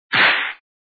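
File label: whip crack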